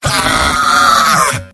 engineer pain critical death; tf2; mvm

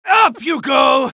medic taunt flip exert

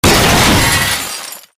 grinder bot, ambient; Garry's Mod; tf2

